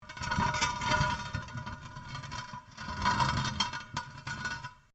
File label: METAL ON STONE LARGE SCRAPE; Metal (Sound Effects:Materials)